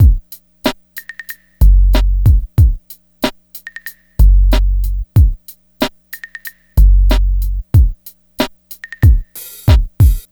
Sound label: DRM, Hip Hop and R&B; Best Service ProSamples vol.02; AKAI